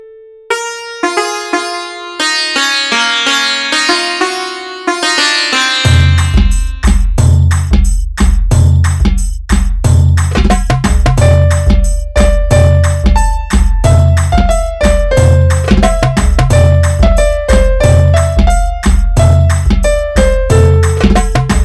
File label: Super Beat